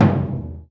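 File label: loud drum, Burundi; Heart of Africa; Spectrasonics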